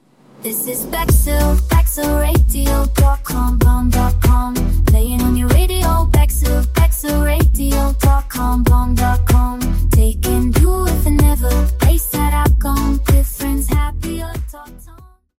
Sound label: Fem Singer, jingles; Bexhill Radio